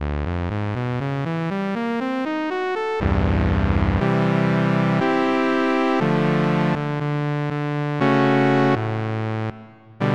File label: Syn Brass; Onj's Soundfont